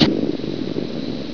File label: ZIPPO